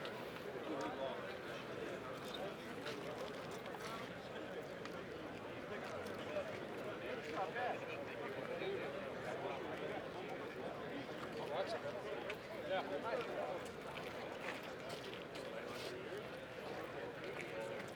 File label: CROWD BUS STOP HIGH; SIMUZENSOUND